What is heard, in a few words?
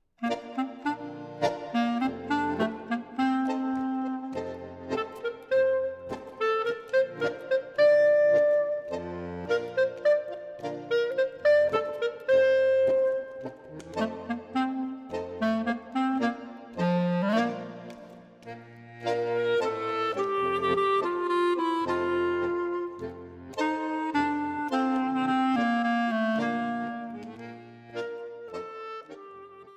Tango